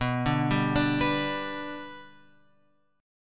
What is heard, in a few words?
B Minor Sharp 5th; Bm#5 Chord {7 5 5 7 X 7} arpeggiated